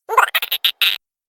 funny cartoon sound effects funny little creature laughing sound effect